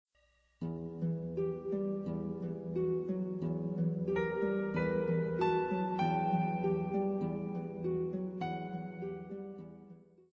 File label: Slow Piano